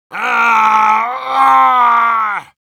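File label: Heavy pain critical death, ru; крик 1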